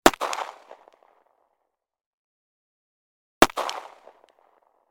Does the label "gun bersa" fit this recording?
Yes